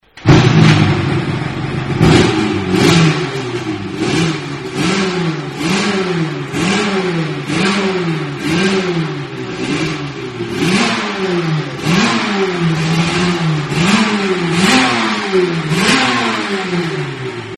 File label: suzuki rg 500 gamma